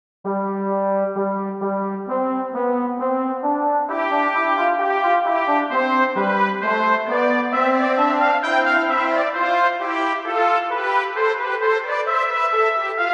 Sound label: brass ensemble; AB Sinfonia; UVI